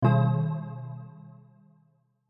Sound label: Incorrect; Gimkit; Android